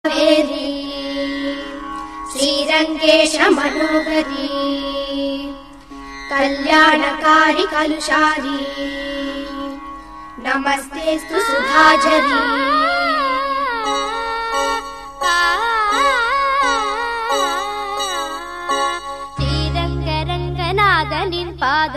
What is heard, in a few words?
srilankan ringtone